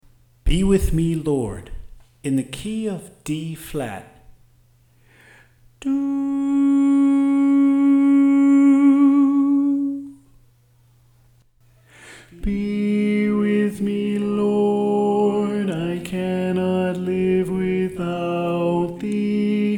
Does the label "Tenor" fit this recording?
Yes